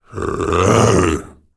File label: afflicted attack